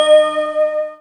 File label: CALLIOP; MicroDexed; Drums